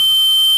turbo; rigs of rods

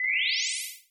teleport; edgar

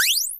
bat die; project hawk

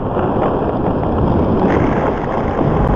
grass; stunt rally; surface

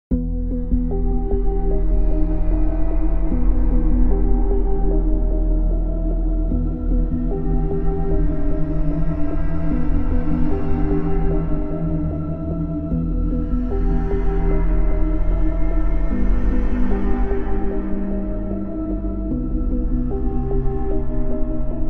Mystical Theme, music; edgar

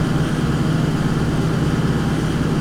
marine small; rigs of rods

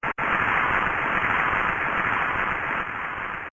water fill; zsdx

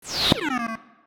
respawn; project hawk

